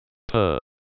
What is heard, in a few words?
purr